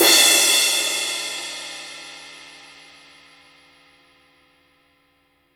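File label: cymbal, Real Drum Kit, ROCK KIT; Best Service ProSamples vol.43 - Real Drum Kits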